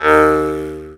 Jaw Harp Uu; Planet Earth; Digital Sound Factory Planet Earth

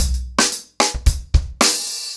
110BPM, ACOUSTIC DRUMS; The Roots Drumloops; Border Case

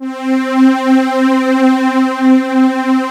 STR SYN, SYN STRGS

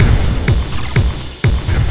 Processed Beat, Drums & Percussion

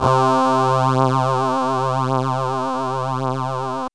PAD L; AMG - Vince Clarke Lucky Bastard

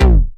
Basses Root C

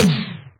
Percussions; Vengeance - Essential Clubsounds Vol.4

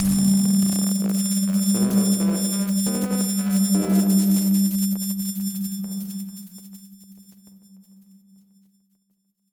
Battered Synth; malfunctioning synth samples

